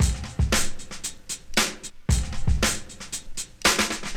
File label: stutter, drum loops, funky